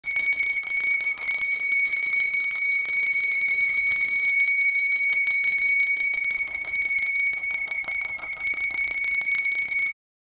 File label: high quality japanese ear